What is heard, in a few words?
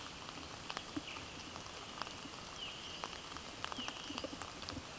Pandion haliaetus